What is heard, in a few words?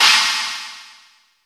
china, Roland D-10